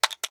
Crab Attack

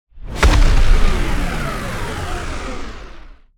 Gatling Gun Death

